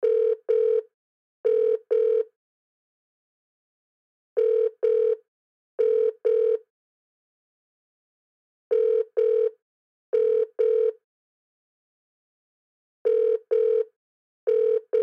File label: Telephone Speakerphone Ring Loop; Office; Blastwave